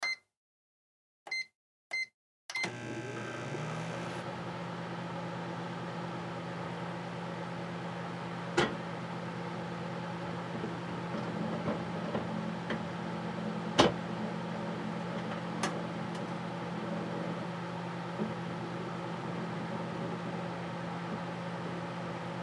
Microwave Sequence, Microwave Button Press Beeps Start Rotating Plate Clunk; Household